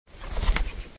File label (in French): Pigeon